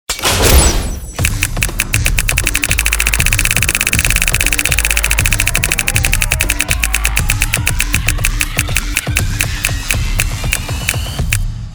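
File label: sounds of casino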